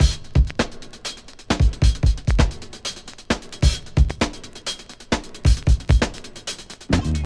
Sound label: 133 bpm drum beat f key, Free drum loop sample - kick tuned to the F note. Loudest frequency: 1200Hz